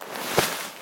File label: draw, item usage; FDDA